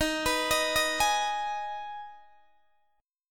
D sharp Suspended 4th Sharp 5th D sharp, Guitar Open D; chord shape